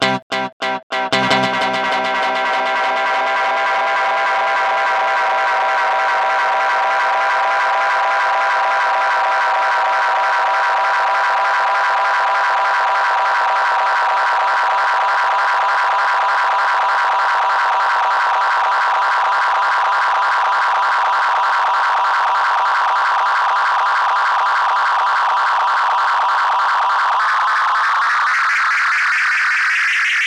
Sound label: delay oscillation and speed test